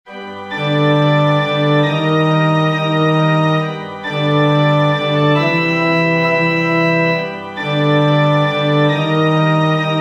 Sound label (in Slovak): Na kosatej jedli baryton; janacek